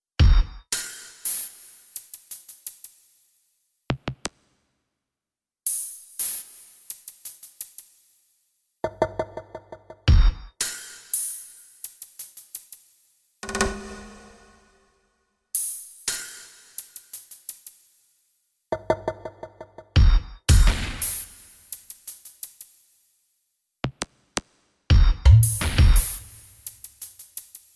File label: only bass